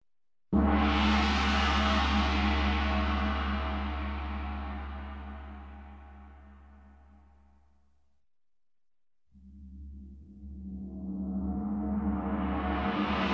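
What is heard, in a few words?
big gong one strike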